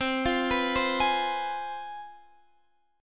C Major 7th Suspended 4th Sharp 5th, Guitar, DADGAD